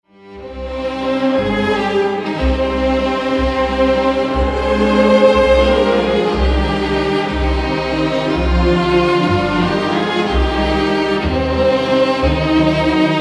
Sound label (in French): grande valse viennoise